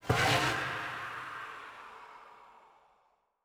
bonus coffin smallest; netent

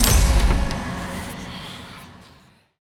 scatter Hit

